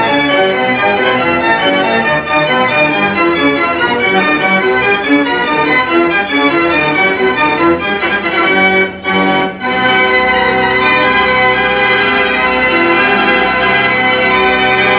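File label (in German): Orgelübung; Kufstein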